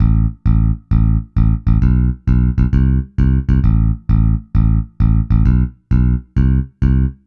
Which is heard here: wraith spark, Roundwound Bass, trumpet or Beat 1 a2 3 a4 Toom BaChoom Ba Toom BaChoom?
Roundwound Bass